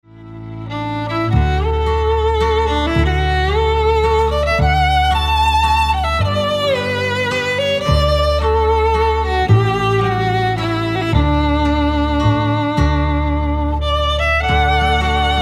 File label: Waltz